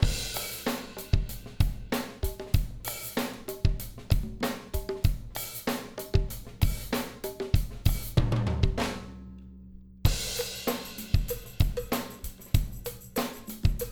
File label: Drum Buss On Drum Bus; Compactor